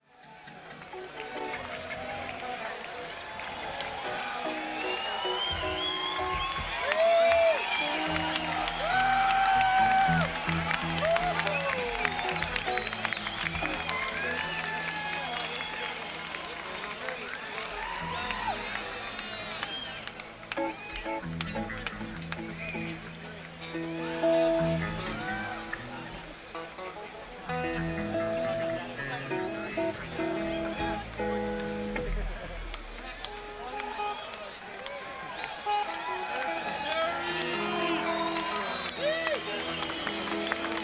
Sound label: Crowd Tuning; senn421